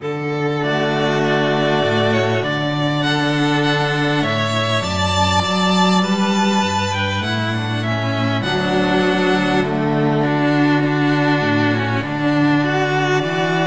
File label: Hark The Herald Angels Sing, Christmas string trio